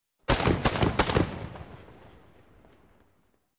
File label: Cannon Explosion; Sound Ideas 2000 Series